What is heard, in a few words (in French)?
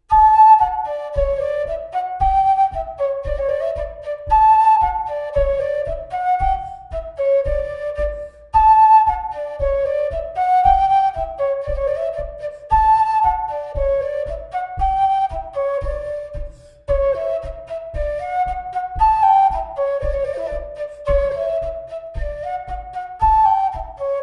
Bourree; Recueil De Raoulx